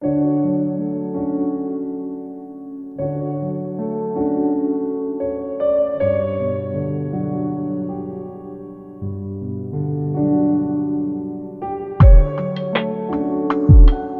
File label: sleepy